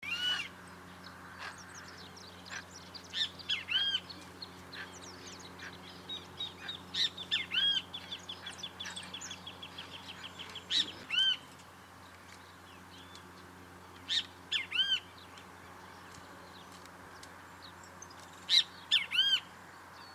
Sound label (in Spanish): Benteveo Canto; aves